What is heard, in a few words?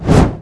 torch; Spanish; pirate room sfx